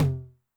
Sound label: Noise High, 909, MID TOM